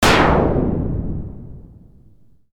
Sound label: forge